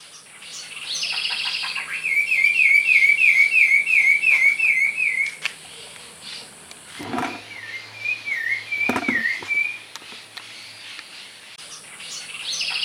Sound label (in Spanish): zorzal colorado voz; aves